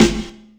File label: SP1200 snare, E-MU; E-MU Formula 4000 Series Vol. 1; Hip Hop Nation